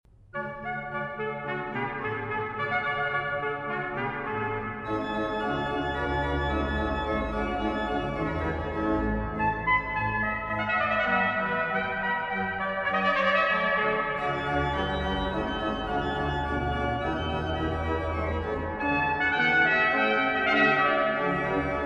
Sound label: D maj; Tartini